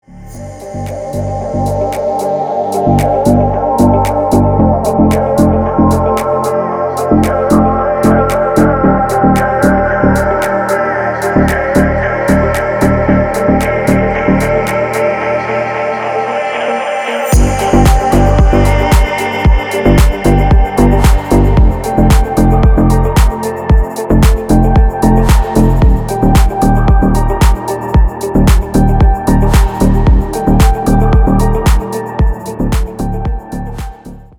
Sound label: Deep Dark Vocal Mix